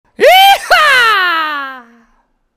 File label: yeehaw